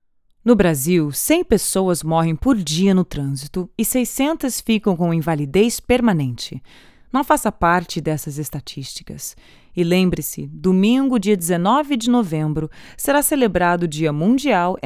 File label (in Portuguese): 1 Voz; DIA MUNDIAL